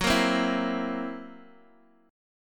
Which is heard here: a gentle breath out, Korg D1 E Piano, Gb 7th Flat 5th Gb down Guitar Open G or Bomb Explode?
Gb 7th Flat 5th Gb down Guitar Open G